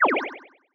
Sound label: Emotion Think; Se Sys Emotion Think; Disney Star Smash; Android, iOS, Mobile